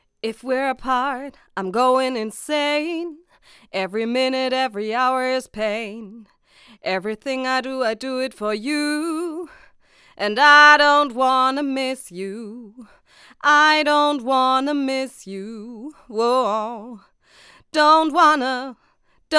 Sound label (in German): tbone sct700 gesang